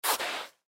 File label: Tennis Shoes Up, Tennis Shoes Pick Up; Tennis Shoes Pick Up Carpet; Tennis (Sound Effects:Sports); Blastwave; Foley